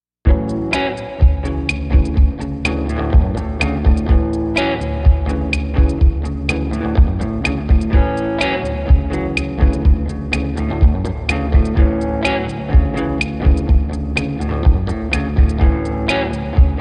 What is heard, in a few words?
Instrumental